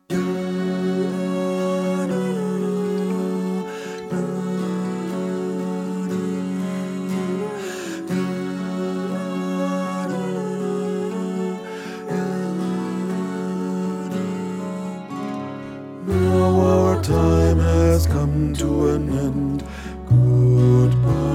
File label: Bass